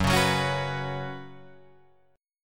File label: Gb Suspended 4th Gb, down, Guitar, Drop D